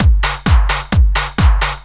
techno, DRUMLOOPS

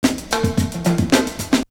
Percussion; Coldcut's Kleptomania VOL1

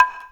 BONGO; InVision Interactive; Percussion Slam

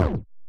Drag Tone, Perc; Lost Tapes From Mars

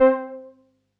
505, String Machines

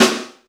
ROOM SD, snare; KICK + SNARE; Best Service; Hyper Dance

